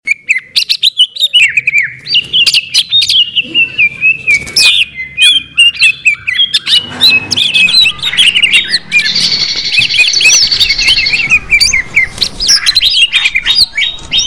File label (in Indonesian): audio simulasi lomba cucak hijau